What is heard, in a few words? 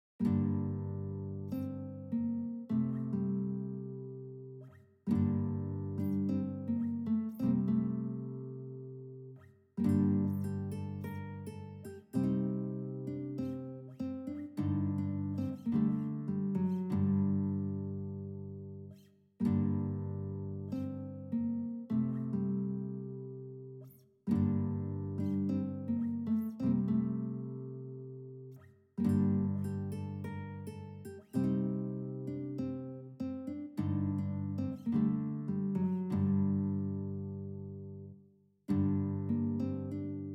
bard lute solo; skywind; bards in skywind